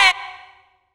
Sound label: Vox Hit G3; Club Techno